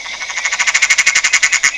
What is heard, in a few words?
Litoria peronii